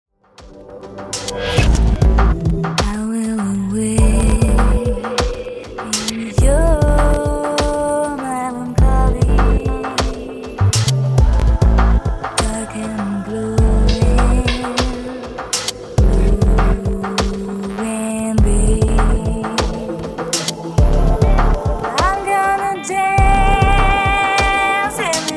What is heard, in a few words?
slow grooves